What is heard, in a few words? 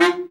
BRA Bari, SAX; Best Service Gigapack 1; BR SAX 2